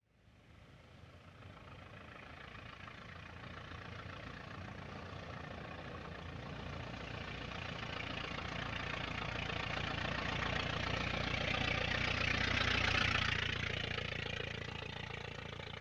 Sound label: Ford Model T Slow By Long Out; Period Vehicles